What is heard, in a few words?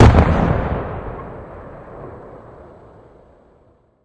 artillery explo